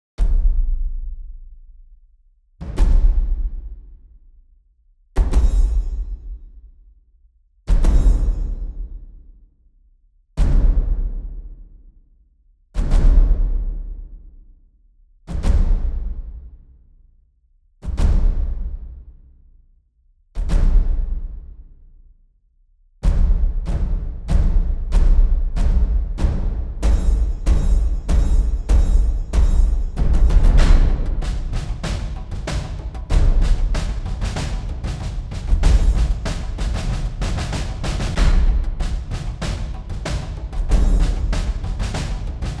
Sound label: Percussion; ProScores